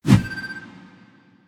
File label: small fire; smite; guanyu